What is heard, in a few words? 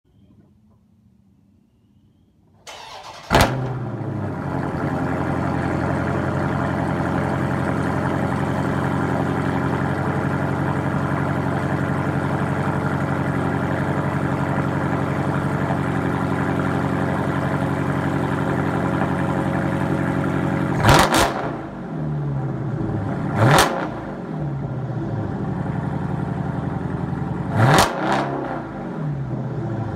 392 hemi race exhaust